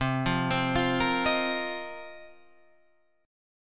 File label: Bb Suspended 2nd Suspended 4th C